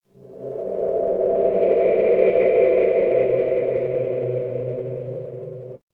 horror drone

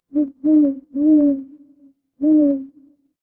animal owl

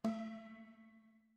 instrument gong

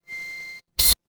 ui beep

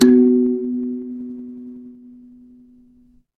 instrument kalimba

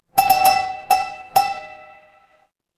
door doorbell

